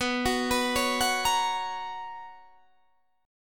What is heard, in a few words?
B Major 7th Suspended 2nd Guitar Standard, chord; BM7sus2; arpeggiated; JGuitar